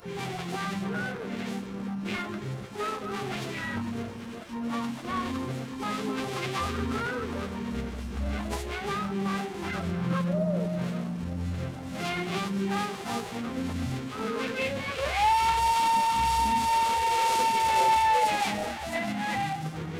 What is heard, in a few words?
Classic Pop; nausica